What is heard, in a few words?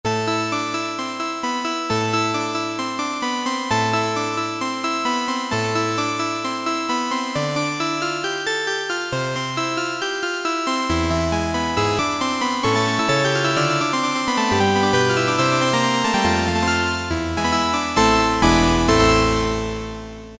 Harpsichord; Cheetah MS6